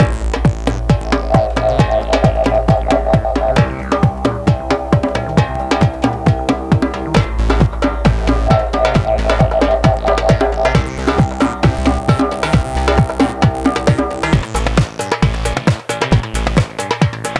Tribal Boucle; Imagine Modern Dancer; Nintendo DS

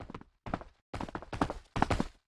Walk Come Two

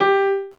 VOC PIANO

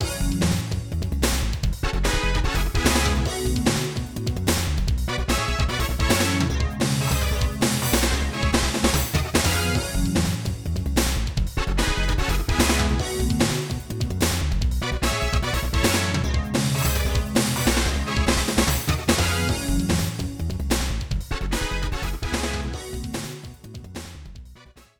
Continue; super street fighter ii turbo; 3do

